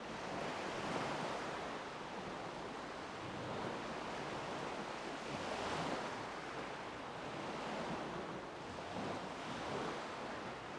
mer

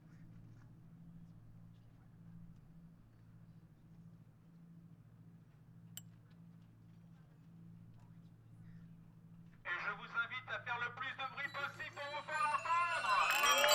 manif velo

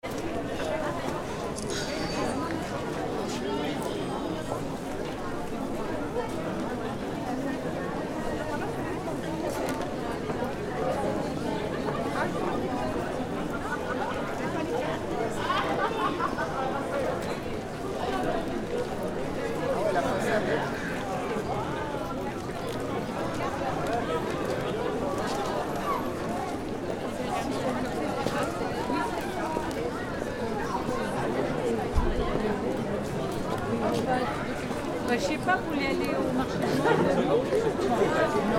sapin

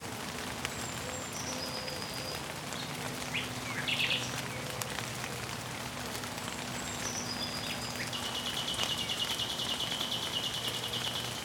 pluie oiseaux

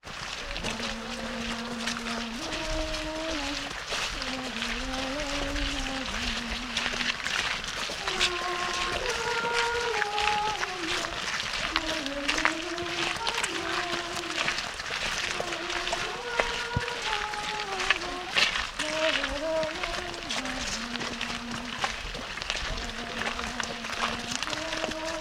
marcher avec les anes

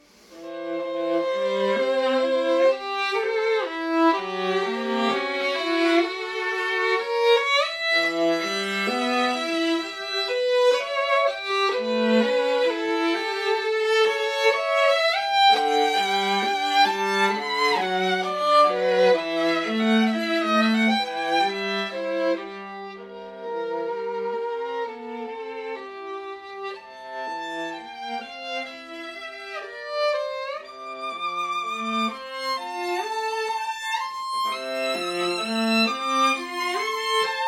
passacaille concert

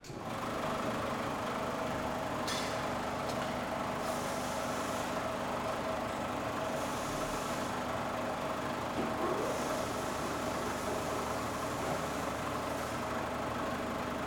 cisaille

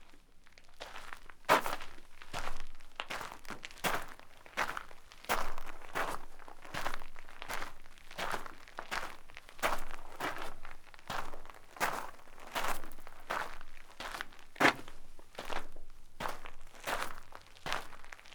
pas gravier; terezin

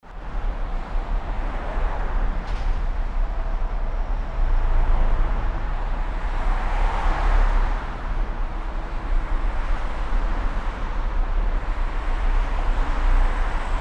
Autoroute

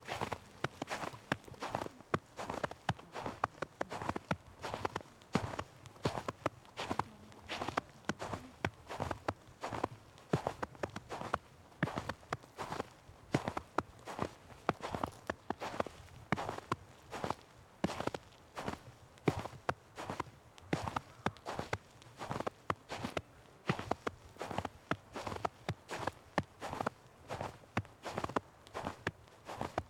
neige

